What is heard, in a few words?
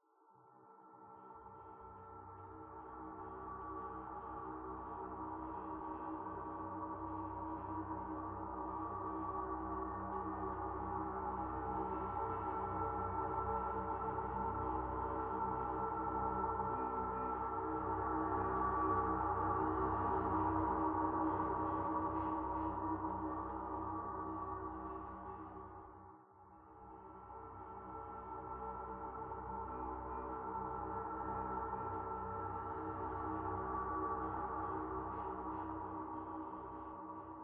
Pad Second Interior two